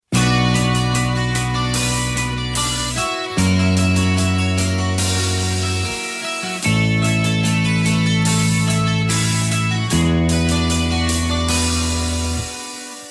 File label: guitar bass drum